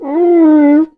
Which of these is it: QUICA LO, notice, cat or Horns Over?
QUICA LO